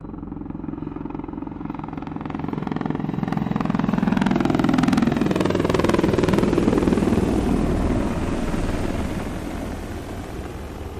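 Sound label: Apache Medium By Right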